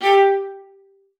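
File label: G5, viola spiccato; Xsample VOL-5; 90 s Sample CDs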